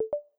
Message Listen End; iOS 27

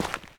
dirt step; Golden Crayon Sounds

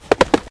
horse; RS Games Sounds; Monopoly

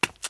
concrete step; Golden Crayon Sounds

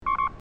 computer beep; Business skieme Beta 1

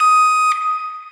alarm; Golden Crayon Sounds

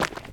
dirt step; Golden Crayon Sounds

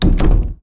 door close; Golden Crayon Sounds

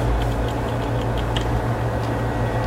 fan; Golden Crayon Sounds

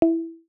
beacon; iOS 26 Sounds

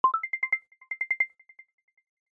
Health Notification Urgent; iOS Sounds

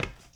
ceramic step; Golden Crayon Sounds

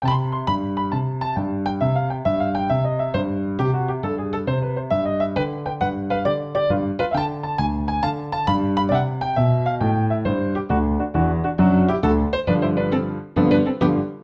encounter pianist, music; Manamon Music; Manamon 1

